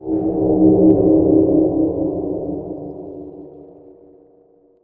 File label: Door Open; Walker Doll Factory - Doll s Song